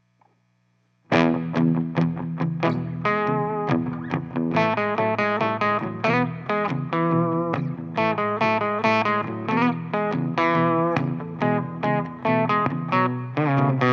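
12AX7 bias Hot 18v power supply, Handmade tube guitar pedal; green boost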